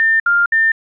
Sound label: beep